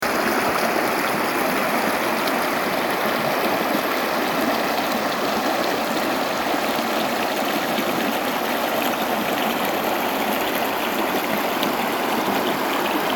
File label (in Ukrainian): richka Dnister na Bukovyni